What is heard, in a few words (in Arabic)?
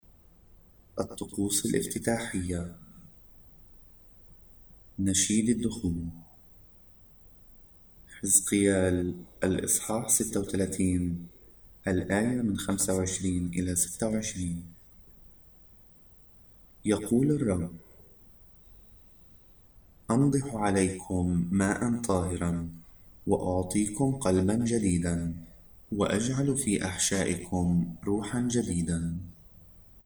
نشيد الدخول